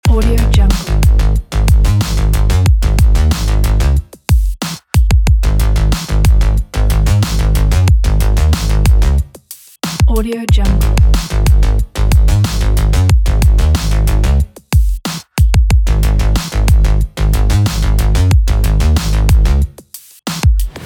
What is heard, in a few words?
abstract background loop